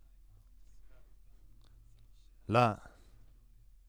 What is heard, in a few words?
Voc OD